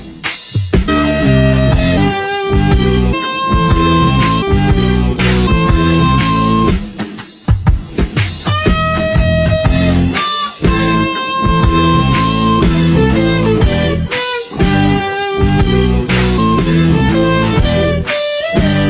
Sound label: Nokia, ringtone